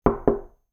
knocking

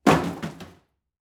dropping

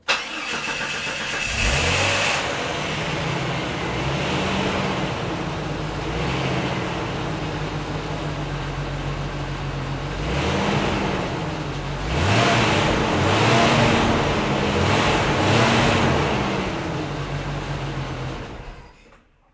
car start off

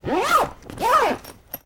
zipper bag

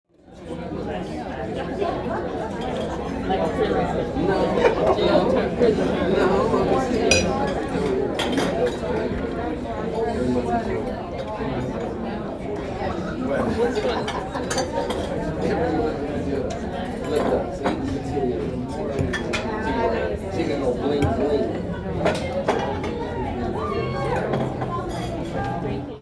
restaurant, ambience

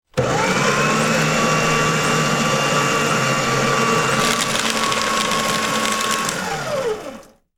paper shredder

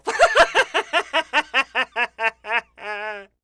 laugh

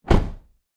car door close

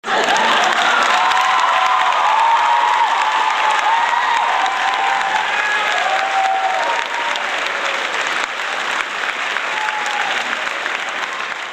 applause

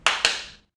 hand clap

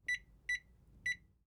microwave oven timer

